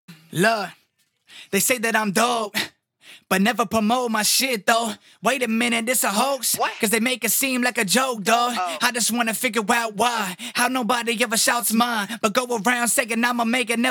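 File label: hip hop 135 B minor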